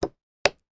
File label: food worcester sauce bottle lid close; sound effects one